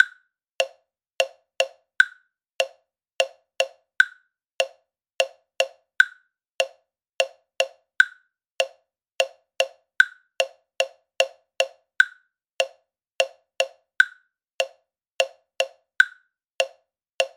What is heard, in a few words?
Click Study, drum kit; Impossible Mission